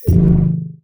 menu exit

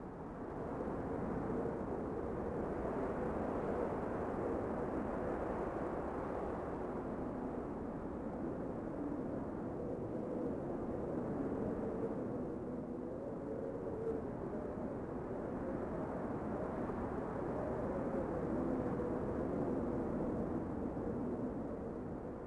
Tundra Bed; Ambient; Civ4 warlords

